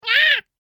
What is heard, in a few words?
Sinister Fiend, monster; Andrew Fleming; Twistedtracks